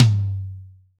high tom g key, tom drum one shot tuned to the G note. Loudest frequency: 798Hz; tom drum samples